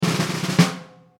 Roulement tambour; Cirque

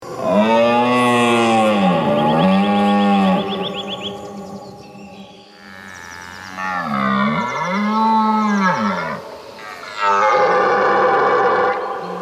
Foret Europeenne Automne cerfs en rut

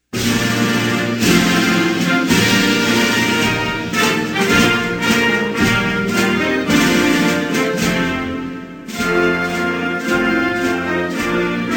Bahamas, Hymnes nationaux

